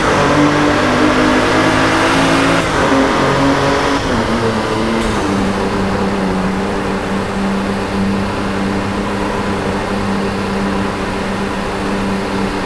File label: fourth cruise, vehicles; bmw 340i